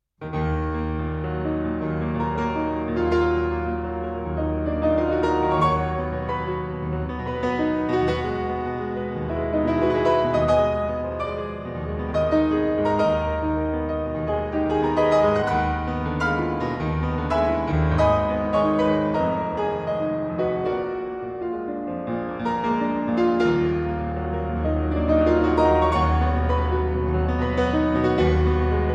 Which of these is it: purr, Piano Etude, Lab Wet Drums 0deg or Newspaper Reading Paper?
Piano Etude